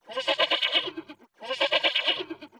CHEVRE

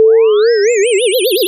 bruit metallique

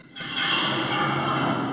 cochon

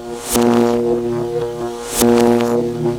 bruit electrique

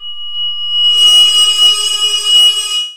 SONNETTE

